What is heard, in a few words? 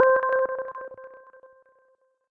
Smooth It Out Lead, Synth Lead; Ableton; Chop and Swing